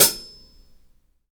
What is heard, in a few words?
shiny hihat sample e key